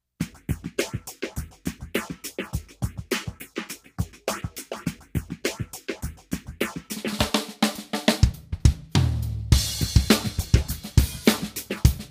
Grooving with Loops

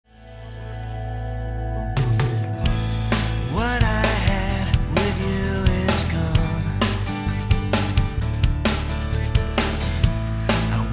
Choir Of Two

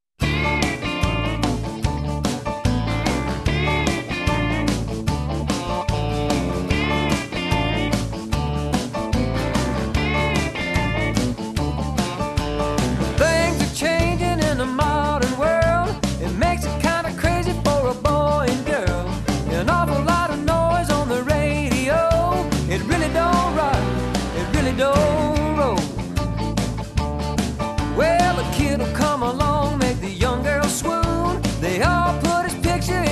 with band

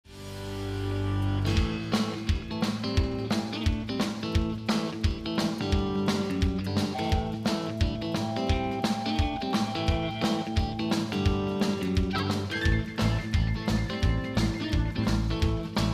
Country Rock Shuffle

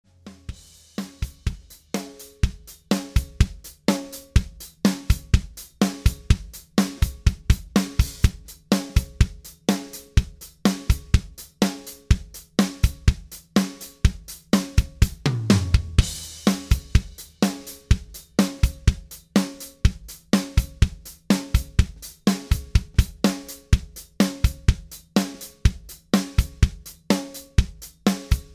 Drum Sounds Example

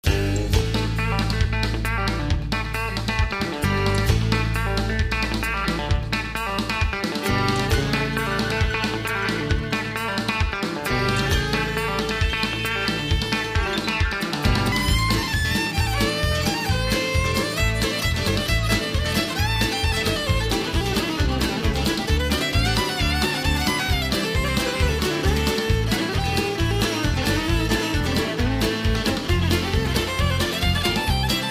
Fast Train Beat

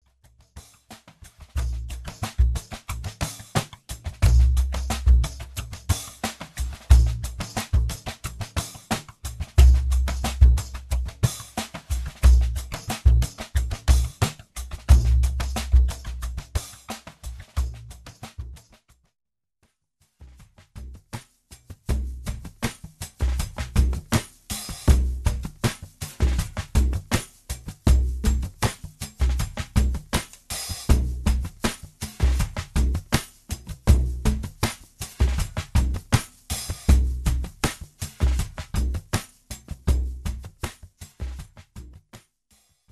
Drum Sounds Hand Drum Loops

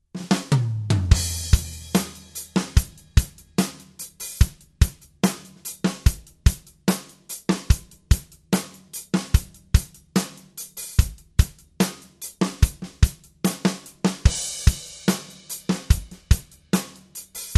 Drum Sounds

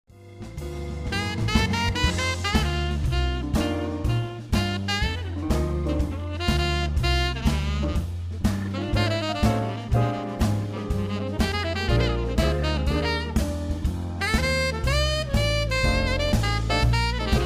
Jazz Standards

